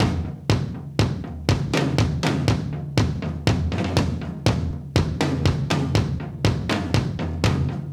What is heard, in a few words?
tom clean